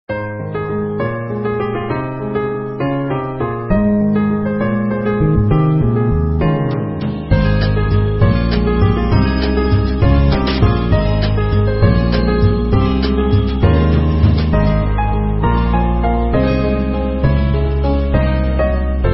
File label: game theme song